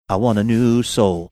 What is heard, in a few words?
singing i wanna